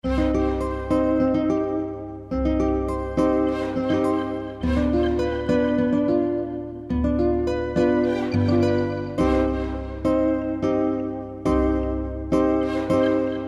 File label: Melody 210 BPM C minor